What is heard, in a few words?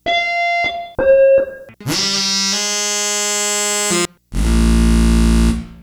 Octave Kitten